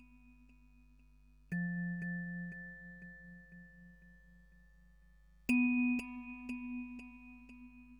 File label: Trance